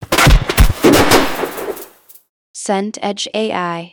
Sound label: thunder close crack